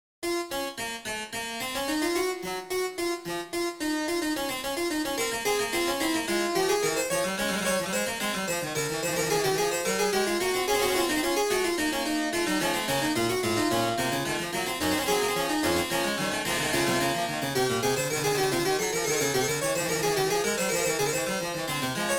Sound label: Fugue; Fokker; Meneghin